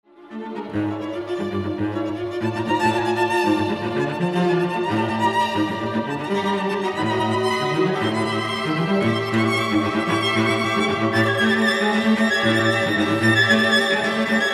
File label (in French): Quatuor; 1er mvt; Vauban project